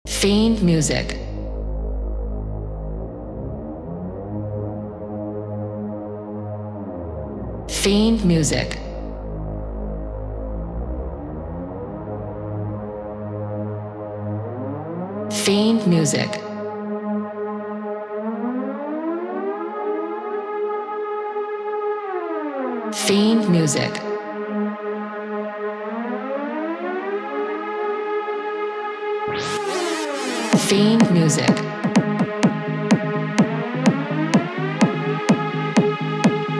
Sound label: Night Siren, tech house 126 bpm